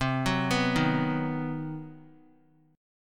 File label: C Minor Major 7th Flat 5th chord, Guitar; arpeggiated; CmM7b5